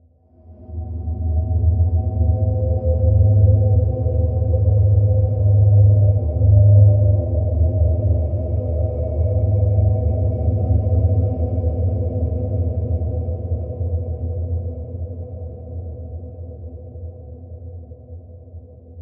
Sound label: Dronescape